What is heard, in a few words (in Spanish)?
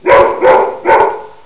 PERRO; El Juego De La Oca Loca